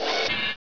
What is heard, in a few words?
run left foot; rustbit; svencoop